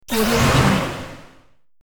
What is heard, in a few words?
spell hit blasting power